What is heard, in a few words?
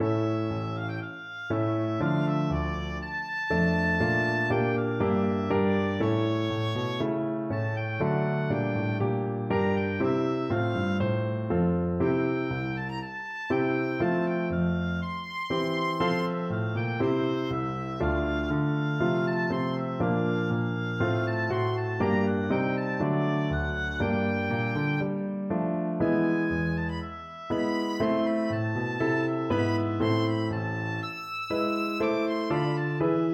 telemann sonata, oboe